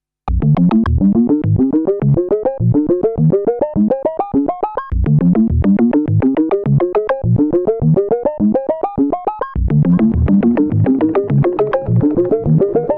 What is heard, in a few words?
Latched ARP